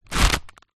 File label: rope stress tighten creak fast